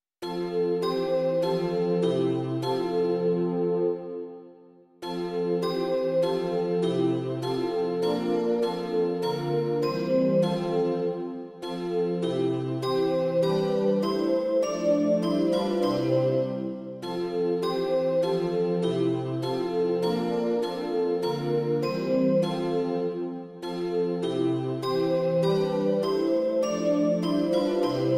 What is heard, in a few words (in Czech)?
syntezátor; Ó vejdi, Bože; Imaginativní verze